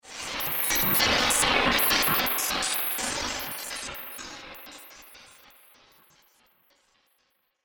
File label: Electronic Gibberish, communication; Royalty free electronic sound effect - excellent sound design sfx for beeps, bleeps, blips; Andrew Fleming